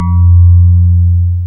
PIA DX E-PIANOS

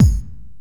Korg x5, Drums

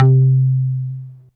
TAKKER C2, TAKK-BASS, Yamaha VP-1; Music Store

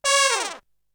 Hit; ZERO G - Datafile DISC 3

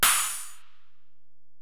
HiHat, Alesis DM-PRO

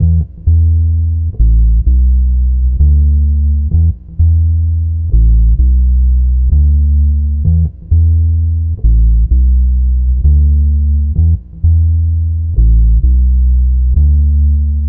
Bass, Trip-Hop Loops; Sonic Foundry; Rondo Brothers Trip-Hop Loops & Samples; Hooked On Hookers

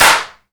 GATE CLAP, HAND CLAP; AKAI S6000 CD-ROM - Volume 5

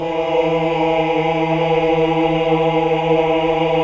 AAH; Best Service Gigapack 1

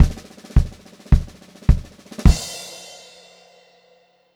106 groovy fill, funk groove drumloops; USB Soundscan vol.38; AKAI

